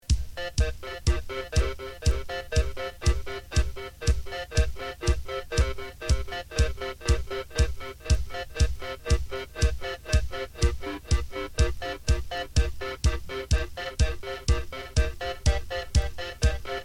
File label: Monosynth